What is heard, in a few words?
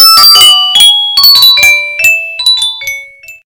Nokia Ringtone 1.3